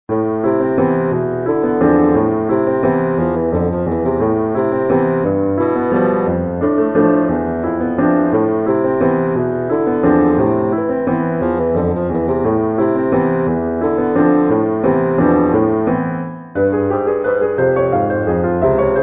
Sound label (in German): Walzer; Eleanors